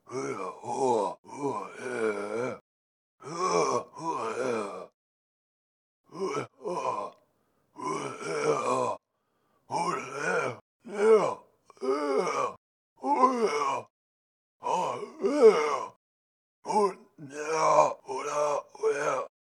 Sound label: rest grab loop